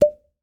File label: Medicine Glass Jar; Medical; Blastwave